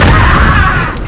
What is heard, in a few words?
Monster Boom